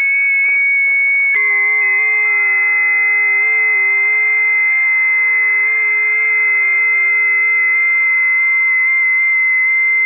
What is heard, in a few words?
20m busy, ft8; ft8 lib; rpitx